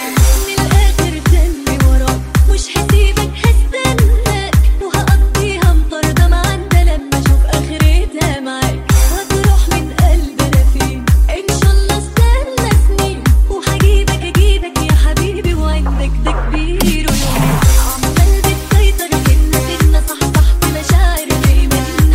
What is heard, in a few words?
arabic ringtone